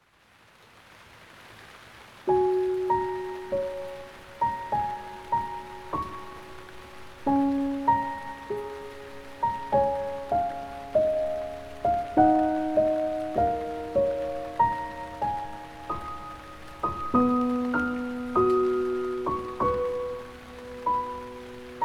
Piano Lullaby (With Rain), Calming Piano Music with Rain